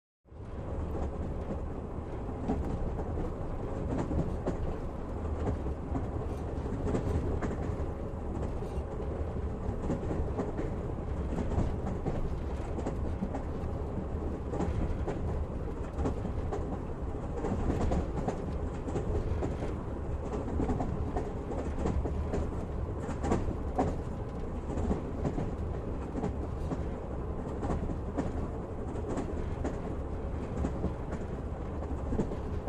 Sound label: Train Interior Stead